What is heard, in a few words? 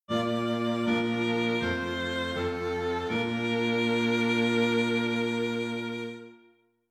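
string quartet, dictation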